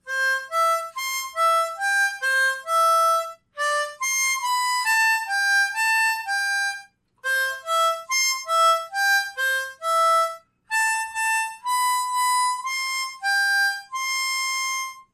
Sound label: Dog Song, Harmonica